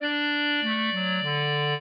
clarinet; Mozart

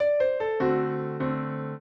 trio, piano; Mozart

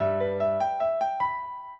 piano; Mozart

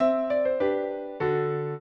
piano; Mozart

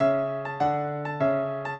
minuet, piano; Mozart

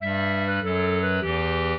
clarinet; Mozart

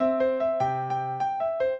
piano; Mozart

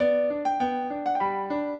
minuet, piano; Mozart

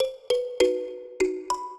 minuet, mbira; Mozart

